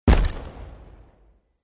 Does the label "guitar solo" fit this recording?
No